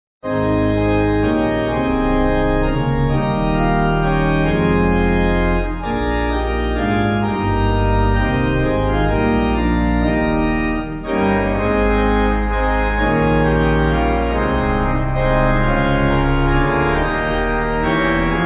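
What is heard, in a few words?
Pipe, Pipe Organ; Jesus Thy Blood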